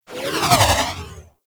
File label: Ball Projectile By; Warframe; Tenno Ball; Missions